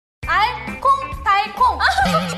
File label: lovely laugh